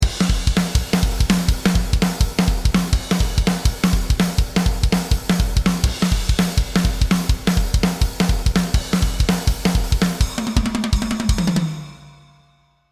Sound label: Thrash Metal Groove 1 - Natural Default, drums